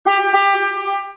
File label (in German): hupe; smart